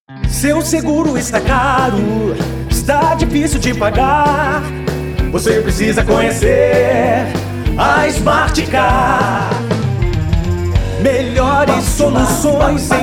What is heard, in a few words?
Smart Car Jingle; Curitiba